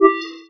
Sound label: signal